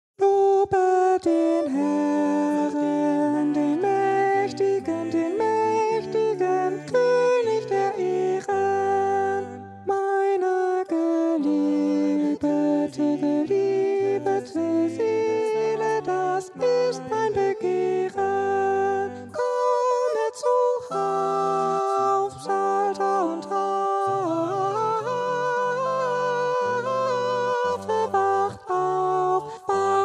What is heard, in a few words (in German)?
Lobe den Herren Alt; distler; Letztes Chorprojekt